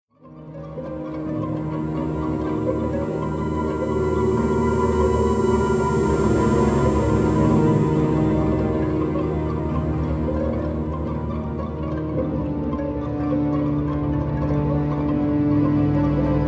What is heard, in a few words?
Background Music Spider Cellar; Granny; android